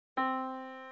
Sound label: Yamaha Disk, piano sound